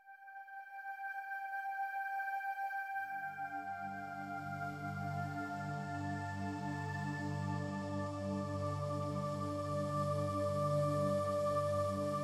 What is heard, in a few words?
Instrumental